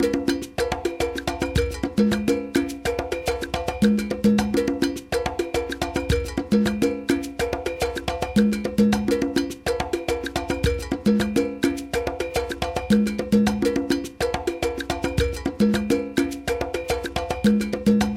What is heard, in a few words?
traditional mozambique